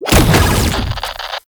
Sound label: Proto Infested Brachiolyst Teleport Stop; Warframe; Abilities